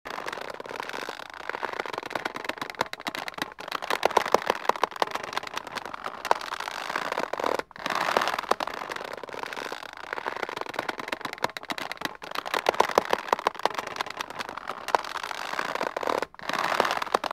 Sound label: Rope Squeak Sound; Phasmophobia